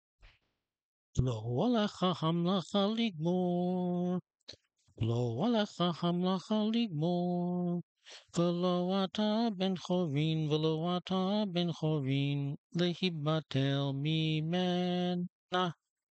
Lo Aleicha Sung; Avot 2:16

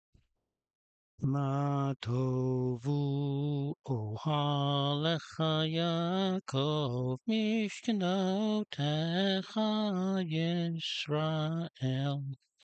Ma Tovu Sung; How fair are your tents, O Jacob, / Your dwellings, O Israel!; Numbers 24:7 and Shacharit service.

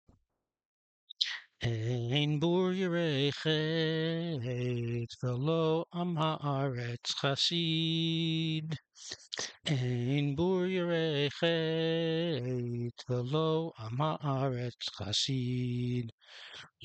Eyn Boor Sung

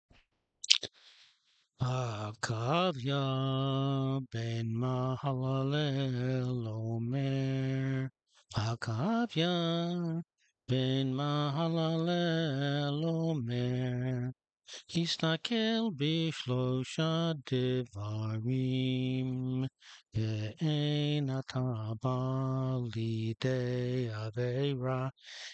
Akavia Sung; This was in two-part harmony.; Pirkei Avot 3:1

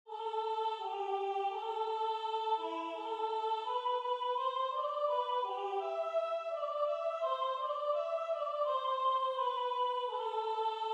Ma Tovu, Synthesized, four-part round; This was a four-part round.

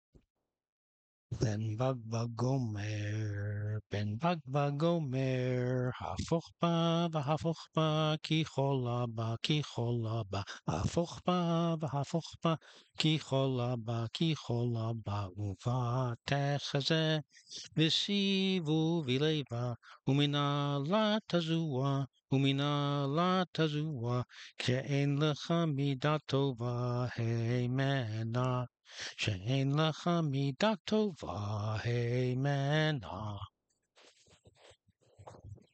Ben Bag Bag Omer Sung; Pirkei Avot, 5:22

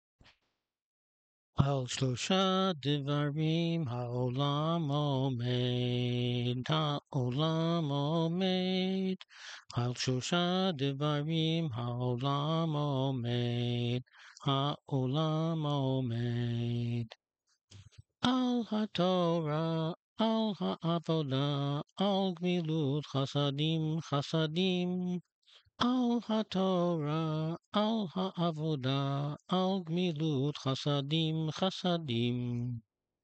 Al Shlosha Dvarim Sung; The world stands on three things: On the Torah, on the Temple service, and on acts of kindness.; Pirkei Avot, 1:2

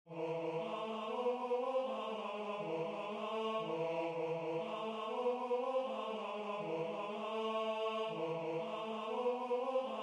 Talmidei Hachamim, Synthesized; I think this may have been a two-part round.; Berachot 63:a and Shacharit service; Rabbi Elazar said that Rabbi Hanina said: Torah scholars increase peace in the world.

